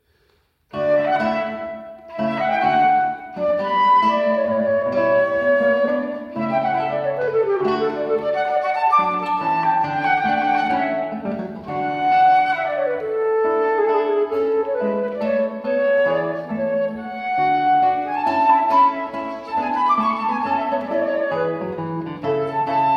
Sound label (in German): Sonate D Dur Allegro; C. Ph. E. Bach